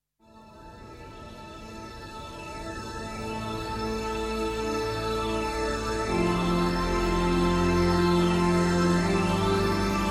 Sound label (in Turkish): gerilim zurna tyros3